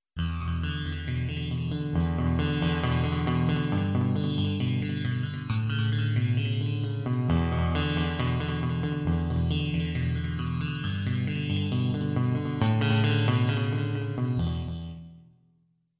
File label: Flange Guitar; sibelius sounds